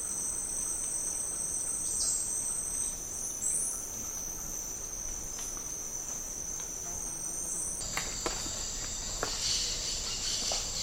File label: tropical rainforest habitat insects